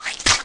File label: Mutant Spit Attack; Valleakan Mutant 1; Aliens